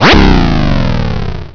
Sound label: ship explode